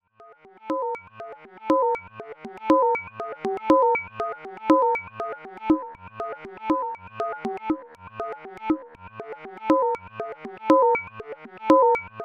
Rossum Electro Music Morpheus Cable Ring Filter Clong